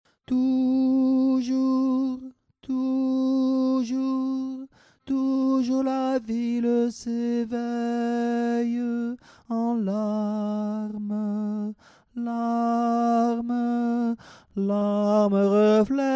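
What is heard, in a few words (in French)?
Ténor VOIX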